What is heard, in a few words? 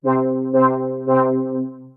Soft Attack Mars Pad, Synth Pad; Ableton; Creative Extensions; Poli